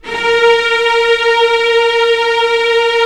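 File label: string section; Roland - LA Composer vol. 1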